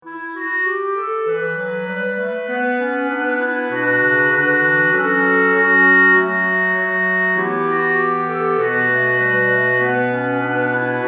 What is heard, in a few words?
satb